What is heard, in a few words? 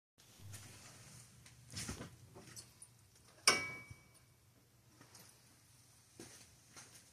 metal clanging noise front driver side CX 5; 36k miles